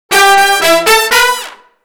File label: Disco Fever, BRASS-LICKS